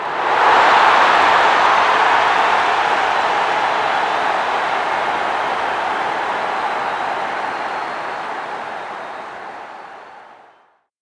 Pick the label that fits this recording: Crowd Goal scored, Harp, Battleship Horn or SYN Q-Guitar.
Crowd Goal scored